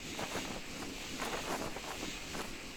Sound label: Falling